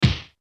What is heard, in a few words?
Body Hit